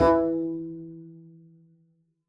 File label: pizz e3, double bass; dsmolken